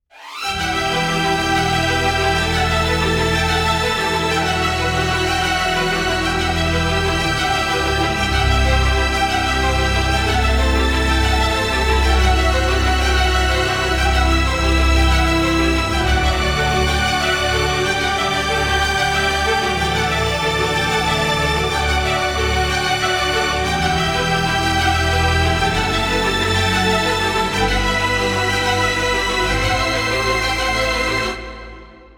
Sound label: Strings processed type B crosstalk